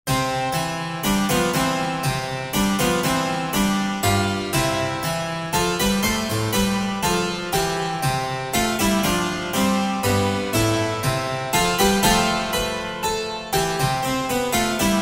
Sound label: Piano Pieces Minuet